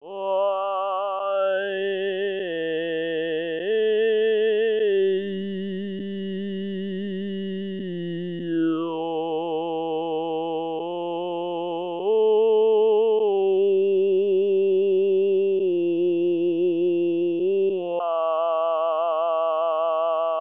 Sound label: formant